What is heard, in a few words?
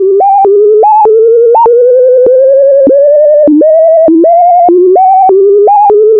random sine; Zero-G Ambient 1